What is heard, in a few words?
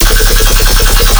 Bass Machinegun 200 C#; exciplex sample presets pack; JinoBeats